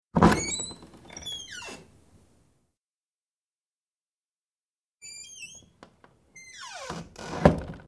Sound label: open close; music-box